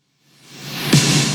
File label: Big Snare